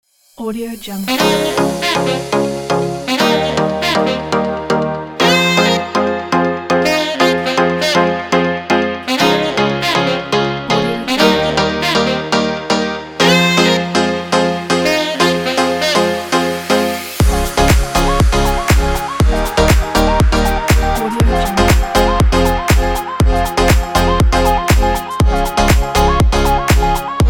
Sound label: lounge house